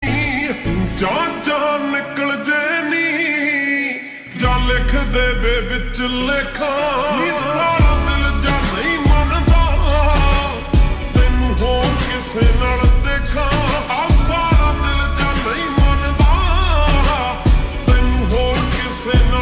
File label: Ringtone Slowed Reverb